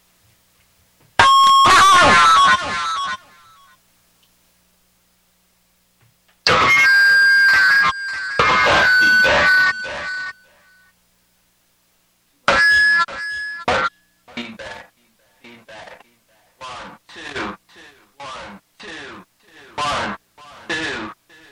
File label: feedback on feedback